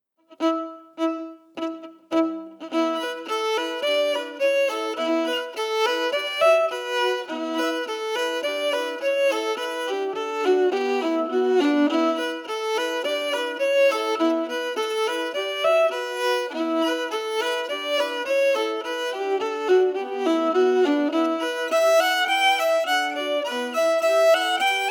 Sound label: Polka melody emphasized